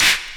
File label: CLAPS HOU; Best Service Dance Mega Drums